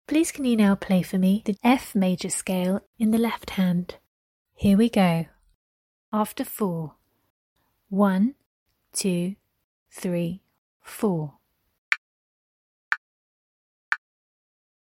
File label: f major scale lh